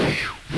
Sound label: fan loop; CD Action; soultrap